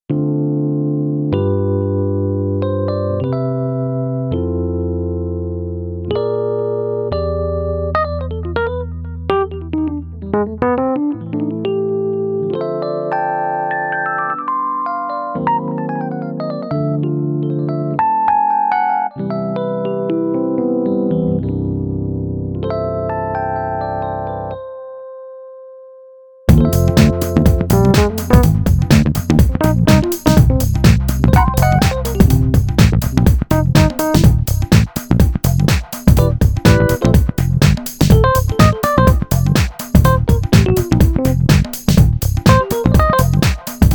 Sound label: Moisture EP, Korg Radias-R